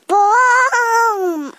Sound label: booon